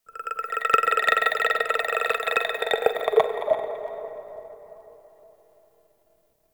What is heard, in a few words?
Corpus Infested Tendon Chatter; Warframe; Ambience